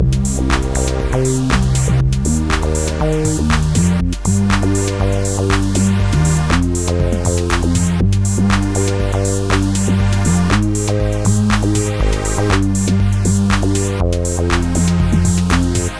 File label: music unprocessed